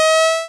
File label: powerup, Retro Sounds 32-Bit